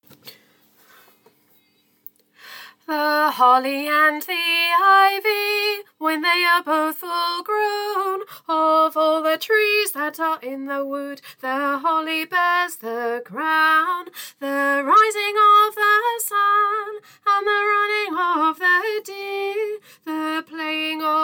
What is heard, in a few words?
alto, Choir; Choir Christmas 2023